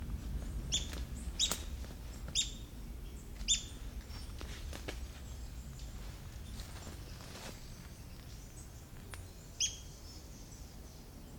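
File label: Montane foliage gleaner, bird; برگچین کوهی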